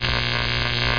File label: buzz; How Many Bugs in a Box